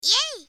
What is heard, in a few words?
yeei